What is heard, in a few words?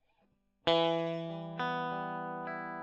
E. GTR Gefell